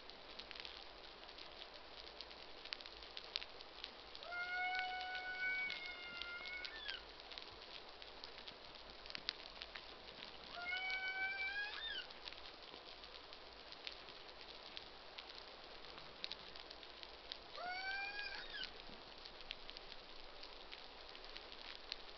Humpback social sounds

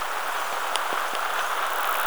Globicephala macrorhynchus Clicks

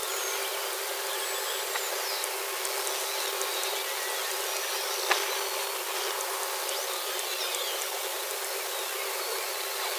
Arnoux beaked whales

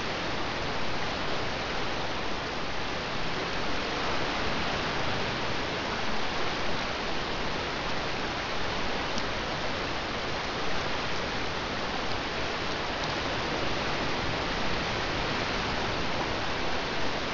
pygmy Blue Whale Two part call

